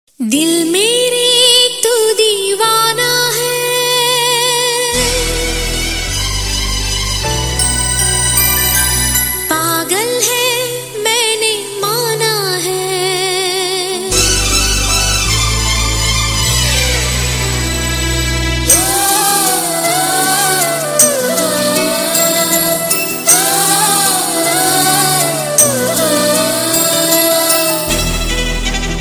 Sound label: female